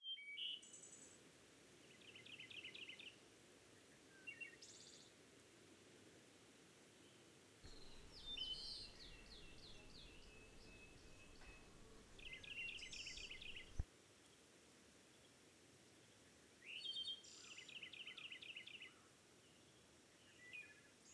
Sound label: SPRING SOUNDSCAPE MONSTERS